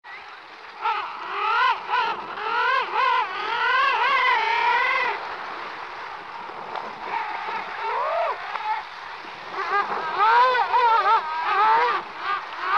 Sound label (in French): cri de pingouin empereur